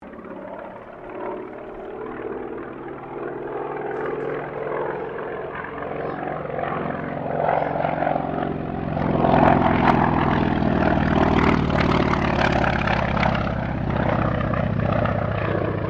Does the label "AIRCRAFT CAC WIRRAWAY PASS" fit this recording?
Yes